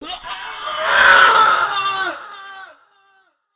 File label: scream